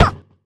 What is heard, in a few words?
catch; HeavenStudio; pajama Party